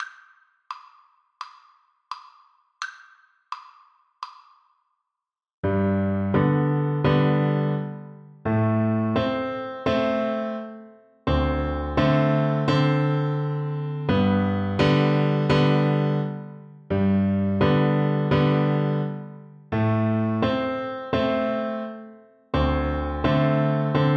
Double Bass

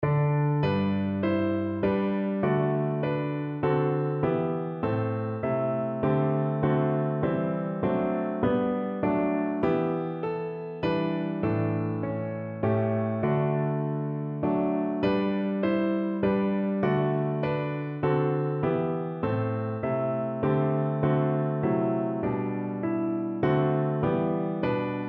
st clement piano

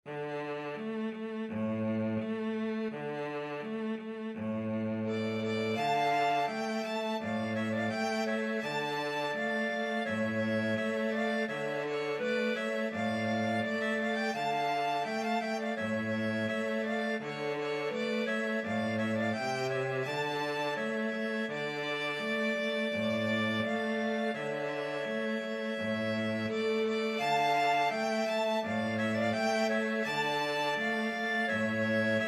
violin clarinet cello; patapan

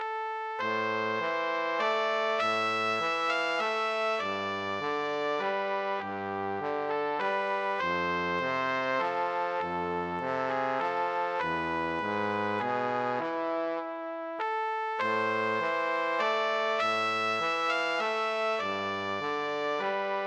trumpet trombone duet

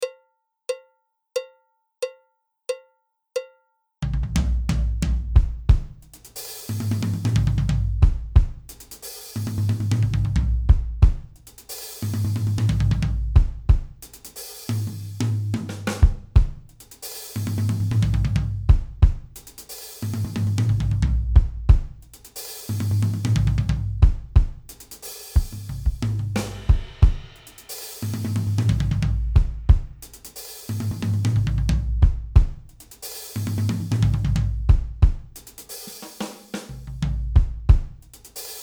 feel, drums

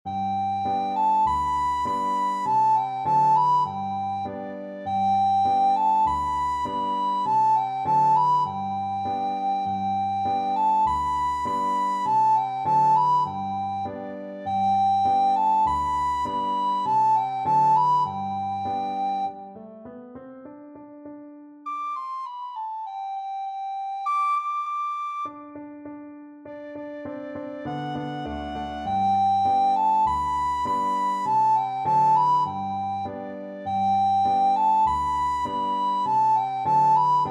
alouette recorder

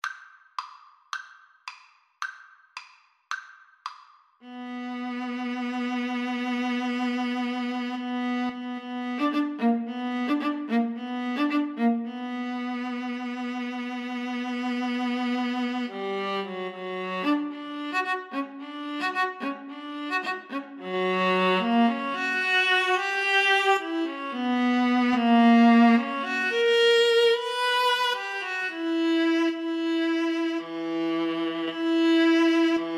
Viola Duet

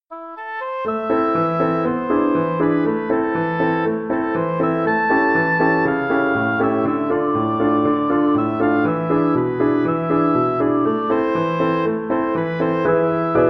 Hevenu Shalom, oboe

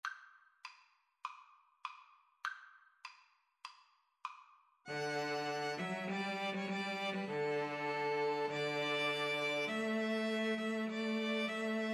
2 Violins Cello

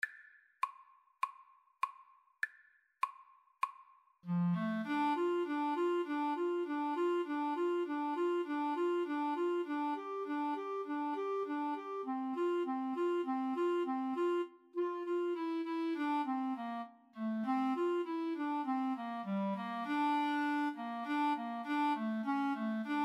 Clarinet Duet

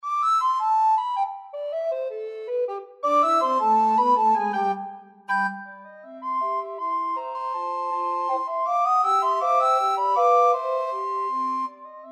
recorder quartet

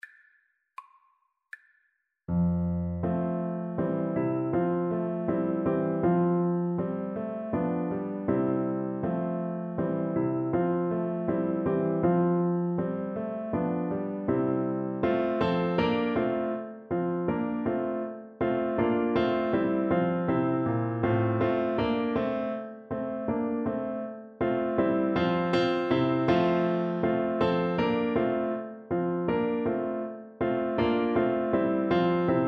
Trumpet

